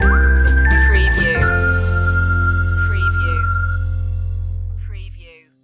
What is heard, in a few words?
nice and easy whistle stinger